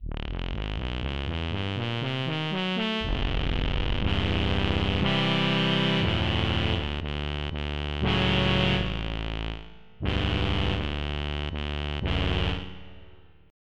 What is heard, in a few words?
Q Brass